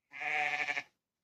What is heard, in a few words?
sheep